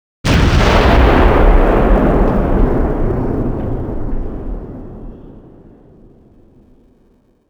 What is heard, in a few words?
mortar; ambience; Soldier Of Fortune II Double Helix; XBOX; jordan